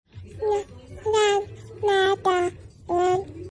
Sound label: Baby without words; صدای کودک بدون گفتن کلمه ای